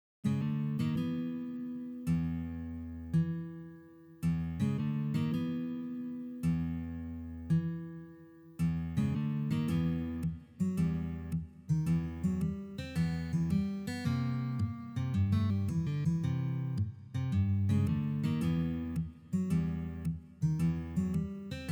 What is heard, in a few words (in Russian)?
na gitare